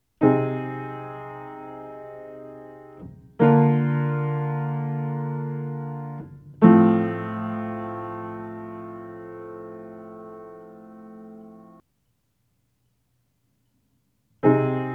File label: midnight piano samples